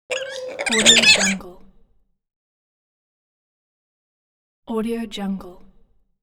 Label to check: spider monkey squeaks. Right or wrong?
Right